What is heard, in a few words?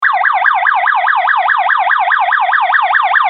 ambulance